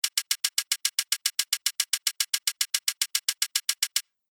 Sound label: Data Tapping, beep; object sound effects